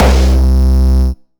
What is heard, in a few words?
Distortion Kicks, Bassdrums